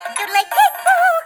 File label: buttercream yodels